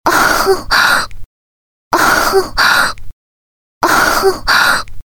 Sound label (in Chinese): 女呻吟 连续